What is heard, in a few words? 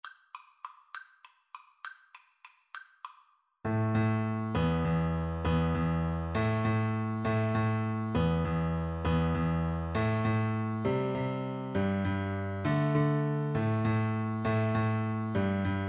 piano duet